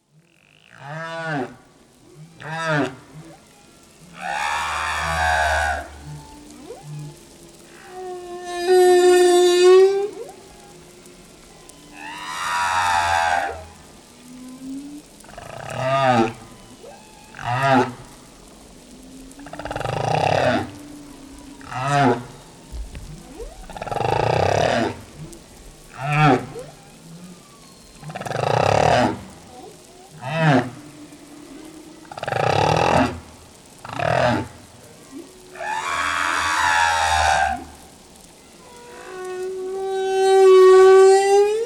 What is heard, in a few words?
humpback whale, Maui Hawaii